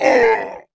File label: fall; fire king